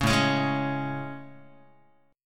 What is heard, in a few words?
A Major 7th A, Guitar; AM7; strummed; JGuitar